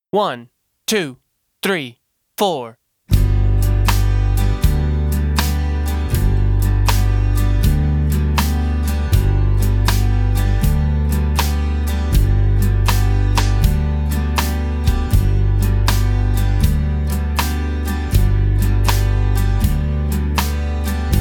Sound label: Single Chord Vamps Slow Country Shuffle G